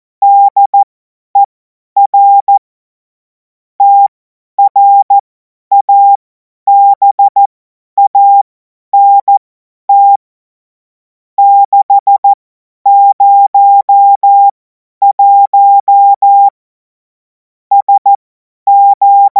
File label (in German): Witz, cw morsen; Morsekurs